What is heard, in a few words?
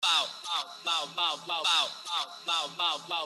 pow pow